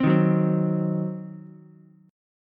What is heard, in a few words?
Eb Minor 6th, down, Ukulele; Ebm6 strummed; JGuitar